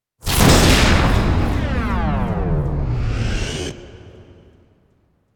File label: Monster attack; Monsterattack Obelisk 02